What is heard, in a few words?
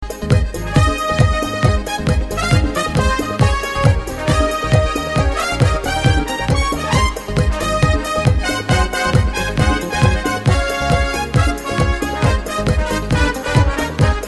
The Best of Merengue